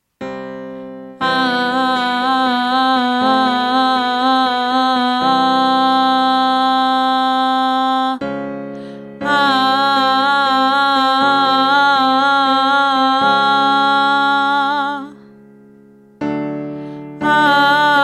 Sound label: Vibrato; オンラインボイトレ